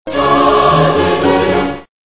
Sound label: boombox short; cstrike; jailbreak